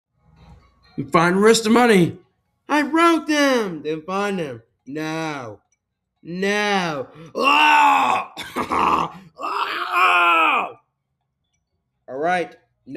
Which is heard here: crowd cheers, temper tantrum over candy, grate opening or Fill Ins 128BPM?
temper tantrum over candy